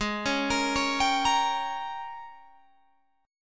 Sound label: Ab Major 7th Suspended 2nd Suspended 4th Ab, down, Guitar, Open G